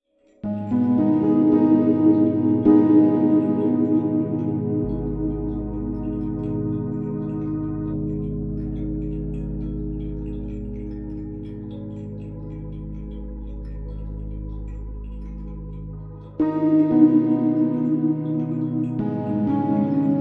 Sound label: Piano Impressions